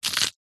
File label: horror bone break small crush fast; zapsplat